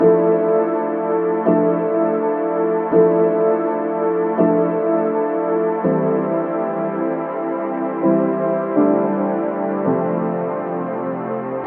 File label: keys, Loops, Sad